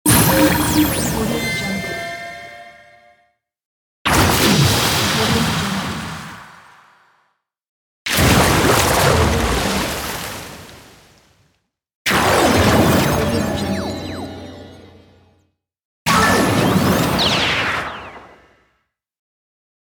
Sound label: water magic buff set